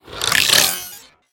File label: impact glitch; soundscrate